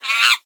Monkey Attack Underlay

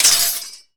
Sword Death

